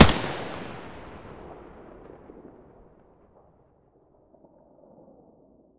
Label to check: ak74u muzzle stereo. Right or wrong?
Right